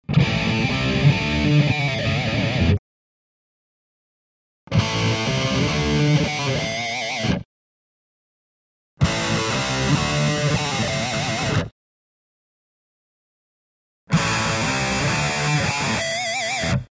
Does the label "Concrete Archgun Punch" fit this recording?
No